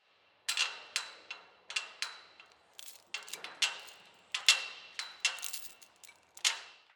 Chains Clinging Against Each Other

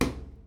Knock

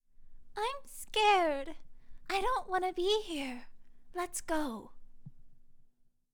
Little Girl Is Scared

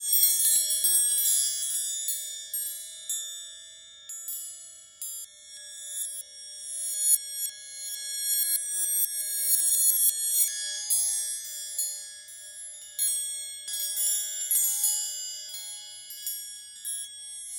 Wind Chimes Metallic Dissonant

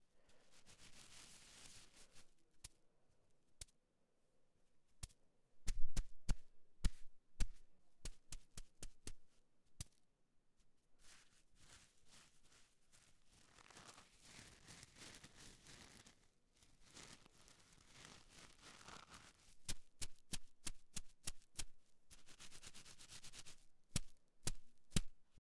Hacky Sack

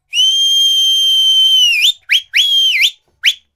Folk Whistle Highlander

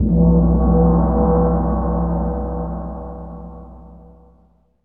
gong

